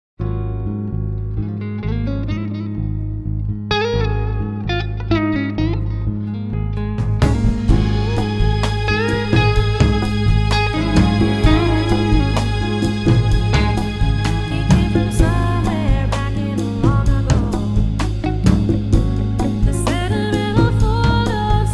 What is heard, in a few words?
Strings